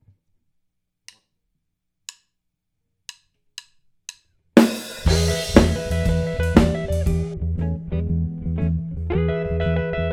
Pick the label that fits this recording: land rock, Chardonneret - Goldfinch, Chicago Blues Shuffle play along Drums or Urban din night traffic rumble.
Chicago Blues Shuffle play along Drums